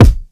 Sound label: cronch kick